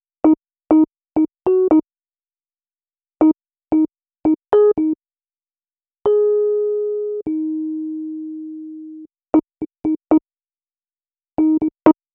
Rhodes, Cinematic Funk

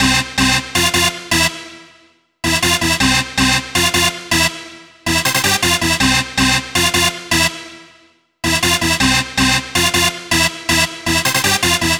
Dance Synths, Hardcore Loops, Synth Loops

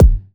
kick drive; kicks

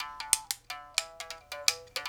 mouth bow, Africa; Roland LCDP11 Africa VOL-1; S.MouthB Phr

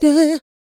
D BIT, Vocal R&B Dance

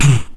VOX TOM; RAP CITY 1

